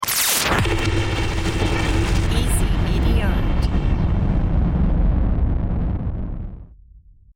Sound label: nitric blast